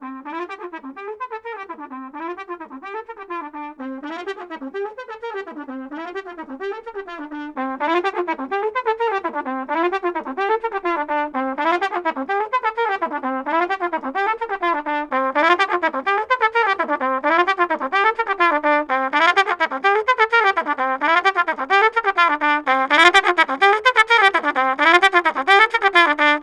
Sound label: trumpet 7 steps